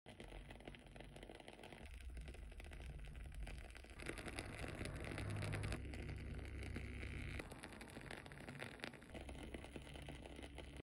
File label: Burning wooden wick; سوختن فیتیله چوبی